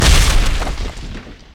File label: martial cadence attack; LoL; jarvaniv